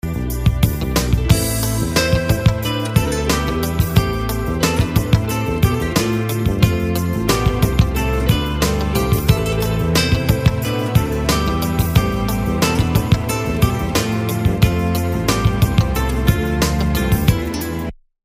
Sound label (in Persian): اینترو گیتار